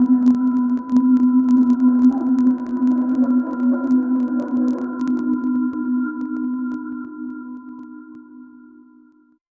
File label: sound effect, highs